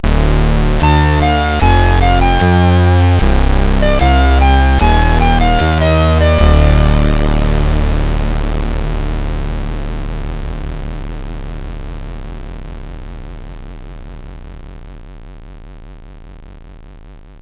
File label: Jingles, sid CSG8580R5; Freeway 86